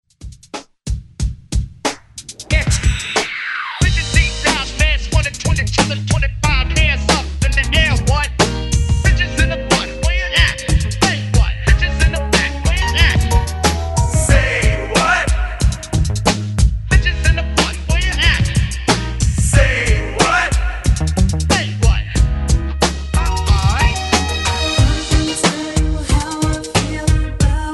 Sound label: 80s Hype Re Drum Remix 91 Bpm; DJDOLLS